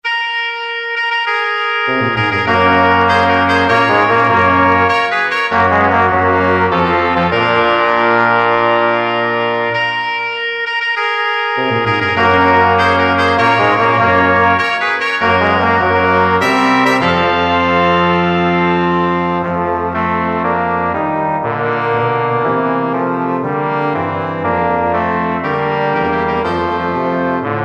Ehrungsfanfare

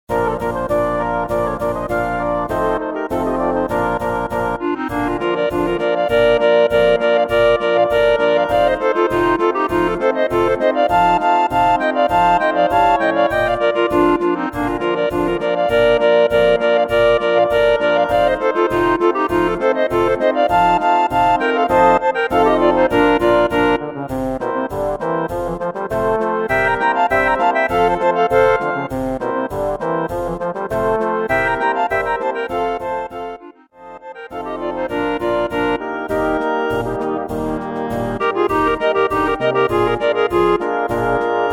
Klarinetten Muckl

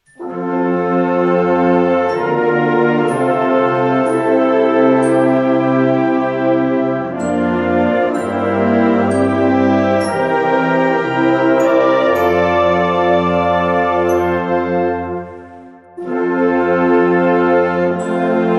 Choral

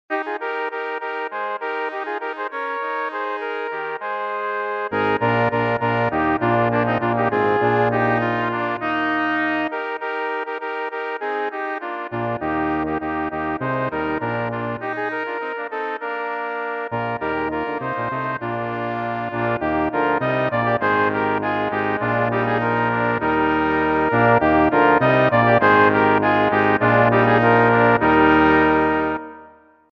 Festliche Musik

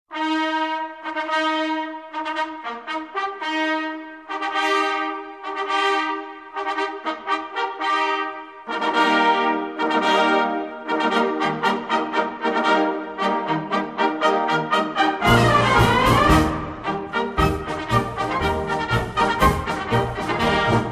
Marsch

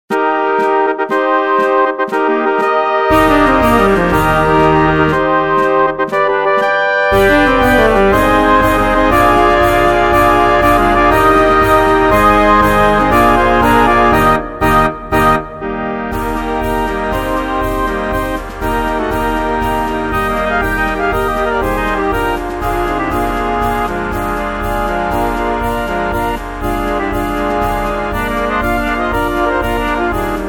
Marsch

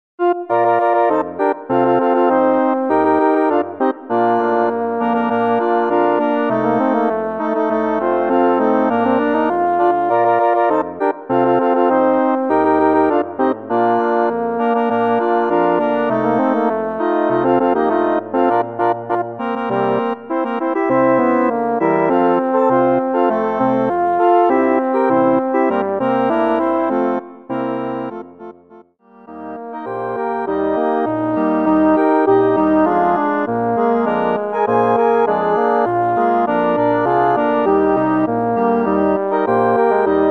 Polka

